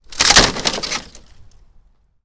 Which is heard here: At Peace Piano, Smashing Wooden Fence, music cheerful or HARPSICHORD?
Smashing Wooden Fence